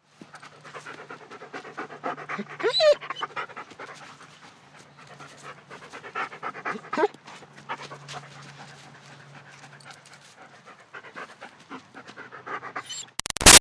Dog German Shepherd Pants Whines Moves; Dogs; Animals

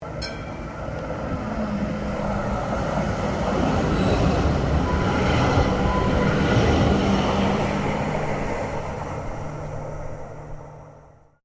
Tram Melbourne C Class Passing Slow; Various Melbourne trams; Streetcars; Vehicles

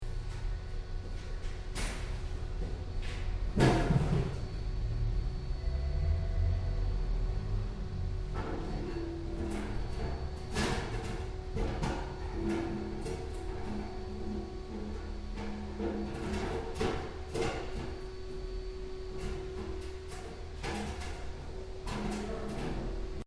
Light Construction Ambience Moving Heavy Objects; Urban Spaces; Construction Sound Effects

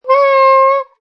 Sax Complain, A saxophone complains; Funny Musical; Sound Effects:Musical

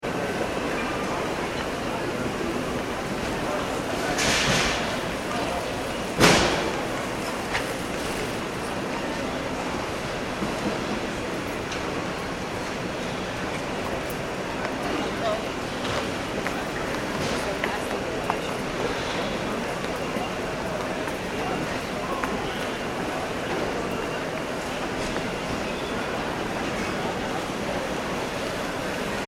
ambience airport check in hall; Airport Ambience - Check - In Hall - John F . Kennedy ( Jfk ) Airport; Airports; Backgrounds; McKinney Sound

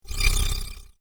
Sweeping Laser Ripple, whoosh; Sweeping Laser Ripple Fx; Andrew Fleming

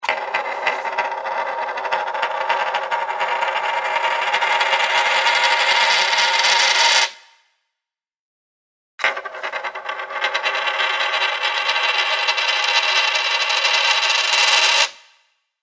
Coin spinning from left to right on tabletop; Money (Sound Effects:Objects)

